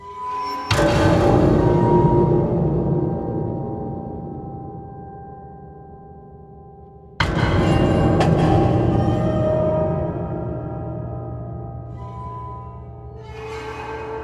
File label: Fight; Executionor Fight; Dishonored